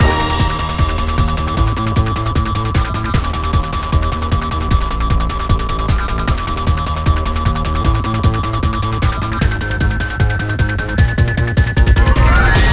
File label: Music Vehicle Chase